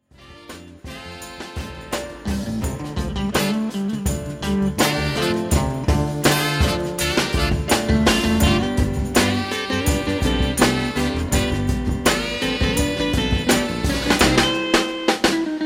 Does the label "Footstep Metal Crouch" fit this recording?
No